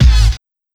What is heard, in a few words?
Turntables